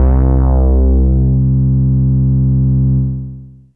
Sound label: M Clav C2, Analog; Fairlight CMI IIe